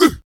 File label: HUM OO; AMG - Now CD-ROM (Roland); Art of Samplng